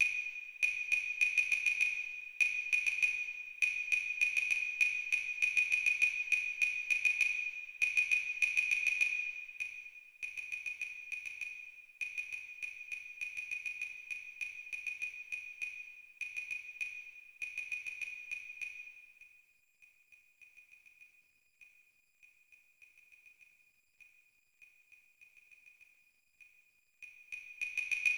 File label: Claves 100 BPM; Percussion Ensemble; UVM Percussion Ensemble